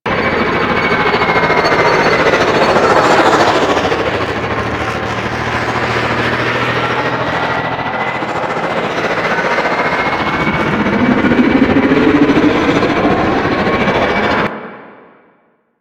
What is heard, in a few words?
Dropship Loop; Warframe; Vehicles; Grineer Drop Ship